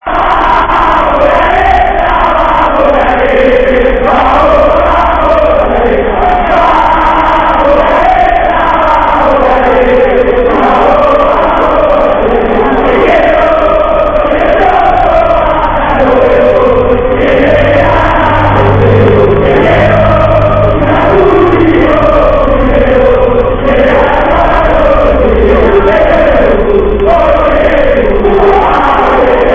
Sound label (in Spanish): cancion; newells